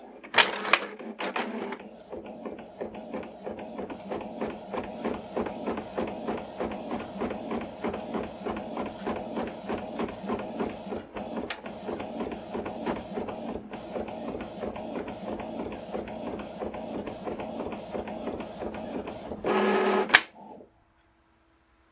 printer

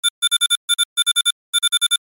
movie type

